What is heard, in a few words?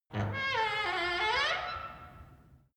wood door old creak high soft